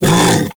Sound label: Vocal Tranquilized Grunt; Warframe; Predasite; Hunting Critters; Characters